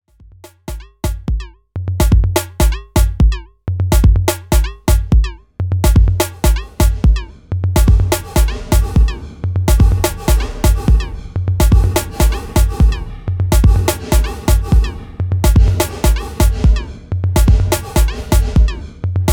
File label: Roland TR-8S TR-6S Reverb; Firmware Update V2.5; Ha Dou